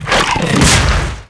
attack

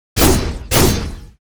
Dead miner attack; KuberaMine

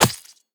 Gun Attack Magic Gun be Shot; GunCrasher

